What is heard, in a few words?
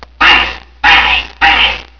bang bang; valve